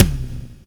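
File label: bass drum, right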